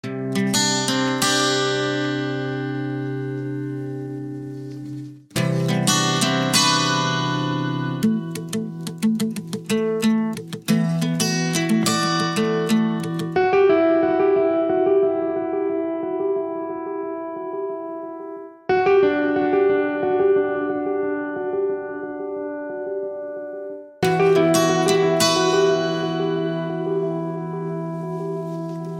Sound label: Ringtone only guitar